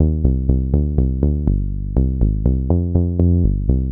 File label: Bass Synth Dmaj Cmin Tonic C, 122 BPM; Pro Bass Synth Studio Kit; MMB